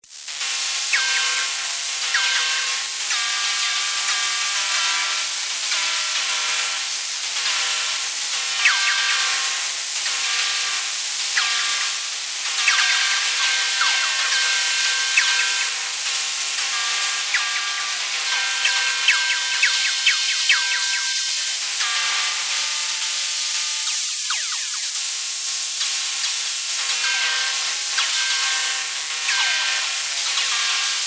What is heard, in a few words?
Futuristic communications radio noises; Galactic Radio